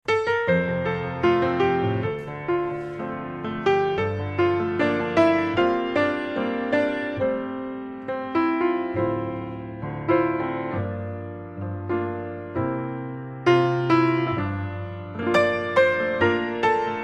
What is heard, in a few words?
Jazz